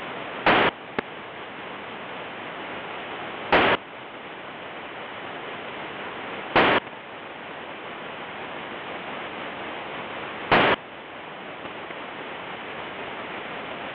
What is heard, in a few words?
VDL MODE 2